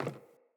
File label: Sandals Run Wood, Footsteps